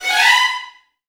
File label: VN FX, Virtuoso Strings